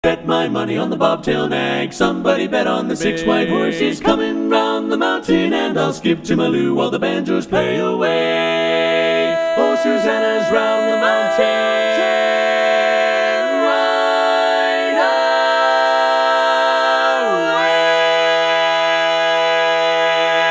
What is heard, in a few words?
All Parts, barbershop